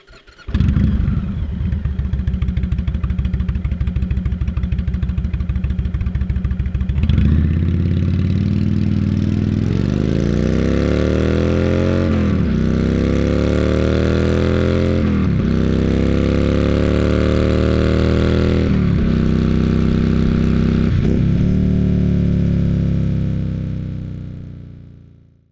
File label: Thunder Stroke 111 engine sound